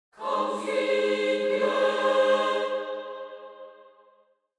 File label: Angelic choir angels video game continue